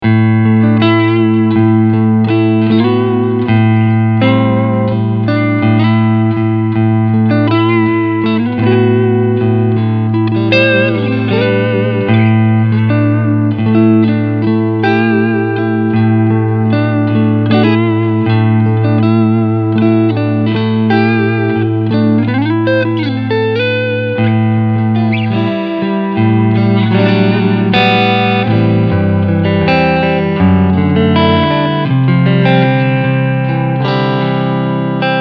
Cleansound I

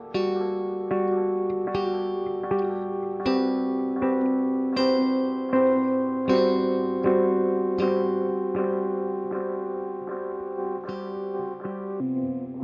Chase Bliss Audio Thermae Step Trigger

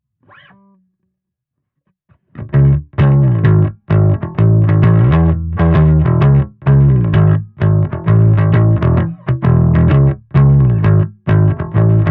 Pop Drive PICK PopMusic

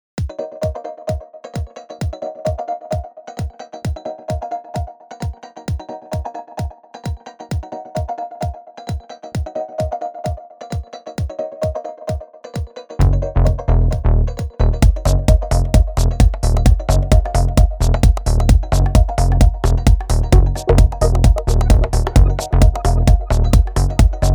techno

